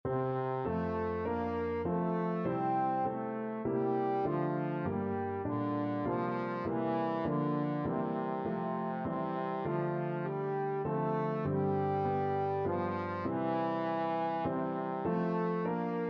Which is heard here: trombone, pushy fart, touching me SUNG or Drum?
trombone